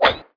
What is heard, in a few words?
wood hit strong